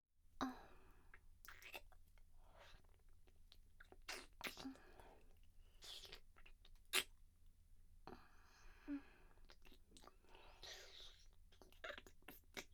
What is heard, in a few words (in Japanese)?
キス; SEあり